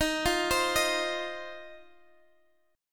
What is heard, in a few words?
C Minor Double Flat 5th, D sharp, chord